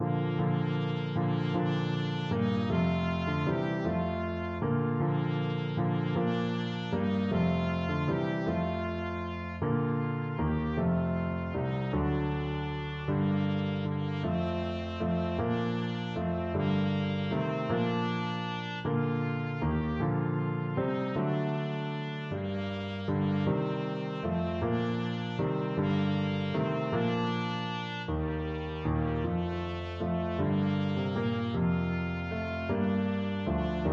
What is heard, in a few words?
trumpet